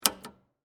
Arcade Game Coin, Technology, Arcade, Game, Coin, Return, Button, Push; SoundEffects-BlastWave